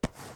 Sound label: Item pickup